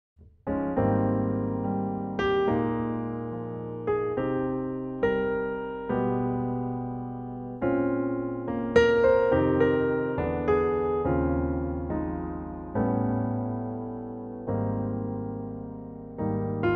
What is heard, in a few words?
Ab Piano